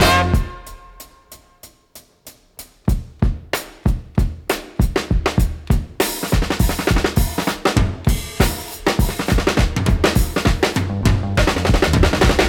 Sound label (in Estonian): Estraadiorkester, Instrumental